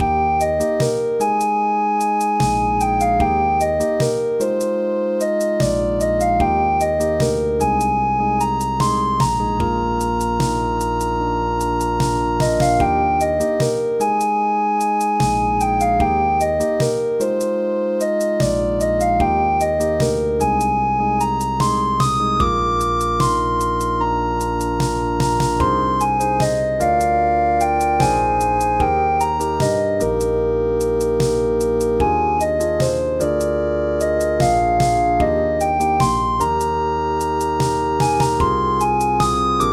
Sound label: Romantic